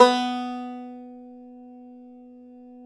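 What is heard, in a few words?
BANJO B2; Synclavier Sampler Library; KEYS AND GUITARS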